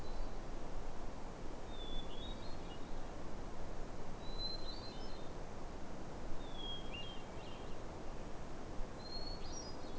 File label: hermit thrush